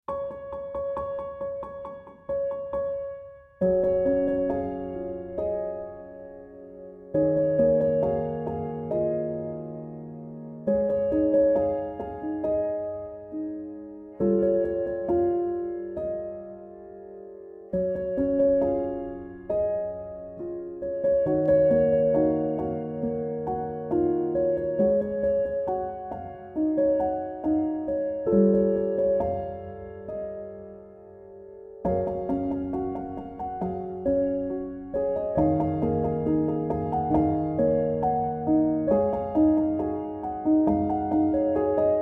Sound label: piano instrumental